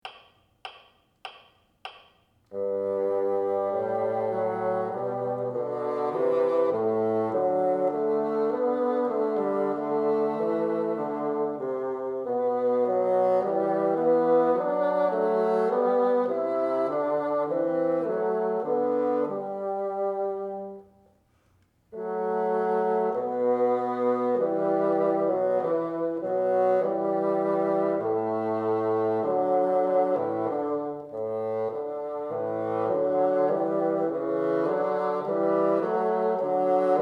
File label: together, bassoon